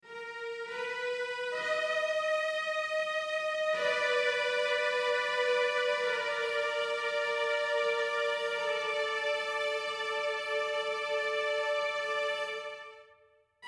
strings; Undead Overlord

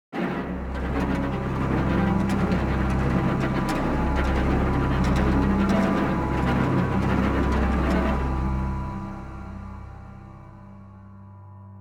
Night Amb Stinger, gamerip; dying light

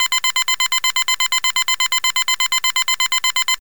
beep

